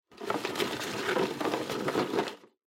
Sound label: Drawing Box, Rustle